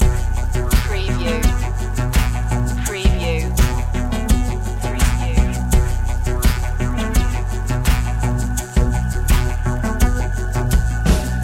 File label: astronavigator loop